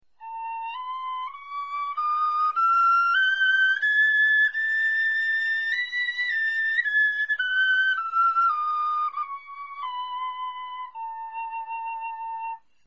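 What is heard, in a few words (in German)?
tonleiter, Knochenflöten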